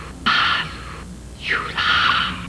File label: flame